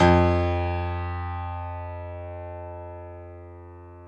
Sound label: PNO Grand Pno; Club-50 - Foundations Roland